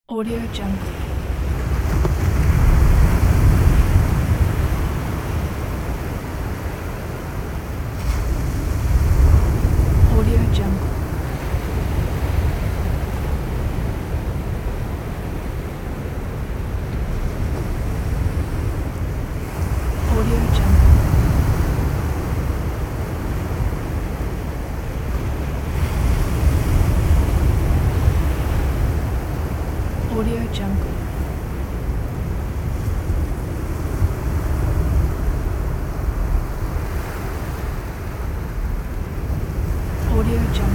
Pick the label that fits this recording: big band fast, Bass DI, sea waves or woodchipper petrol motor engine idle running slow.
sea waves